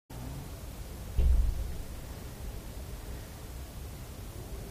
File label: exit door thud; stanley